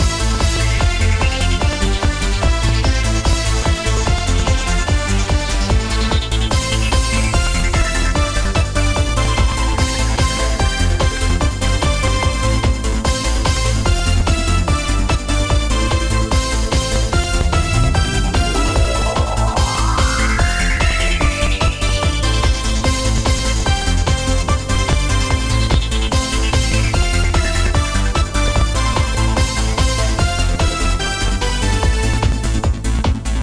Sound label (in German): musik